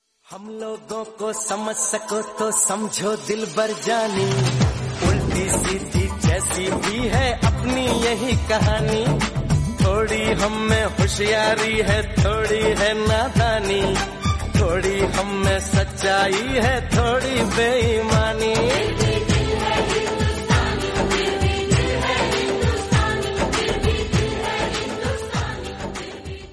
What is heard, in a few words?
hindustani song